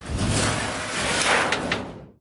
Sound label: door, metal